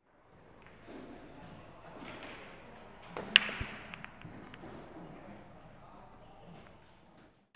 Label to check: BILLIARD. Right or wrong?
Right